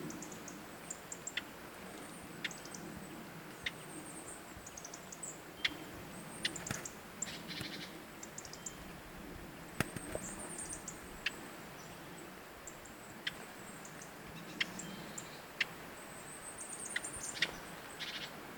bartlaubsaenger

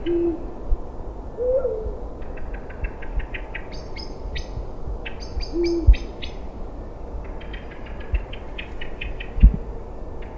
uhu

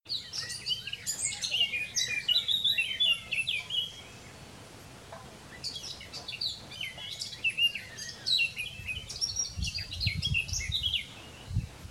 moenchsgrasmuecke

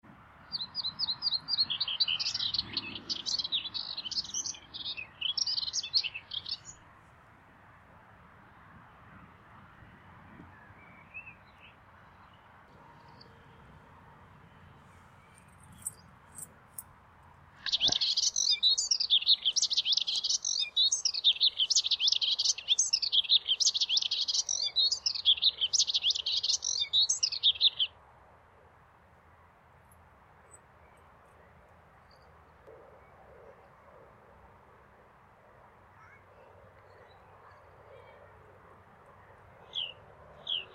orpheusspoetter, club300

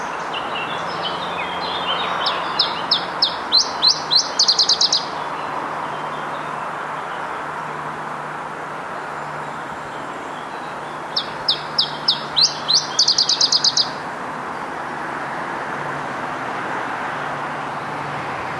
iberischer zilpzalp

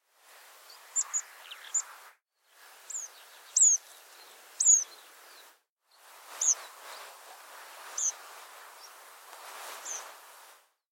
rotkehlpieper

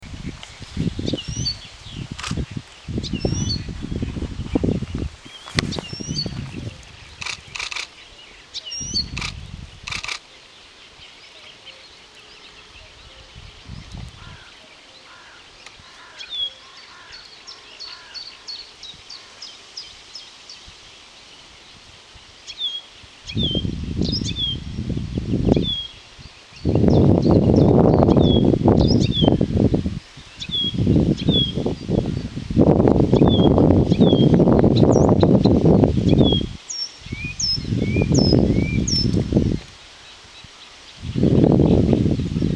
buschrohrsaenger

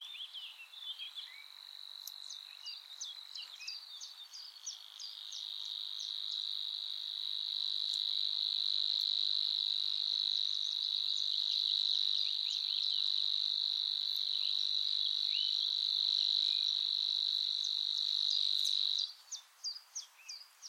rohrschwirl

